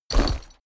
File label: horse step saddle